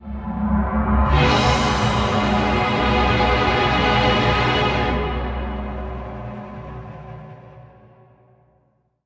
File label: Stairwell Stinger; Scholomance Academy